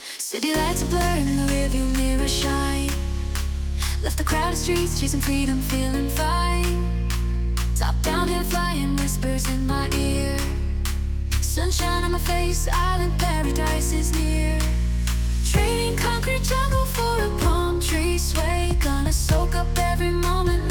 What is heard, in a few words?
Rhythm